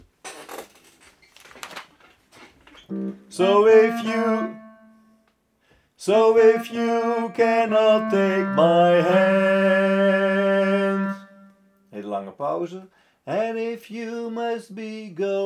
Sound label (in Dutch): bas; oefenfiles